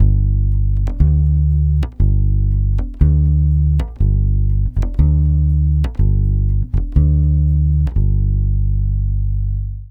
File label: 120 BASS G, Brazil Bossa; USB Soundscan; AKAI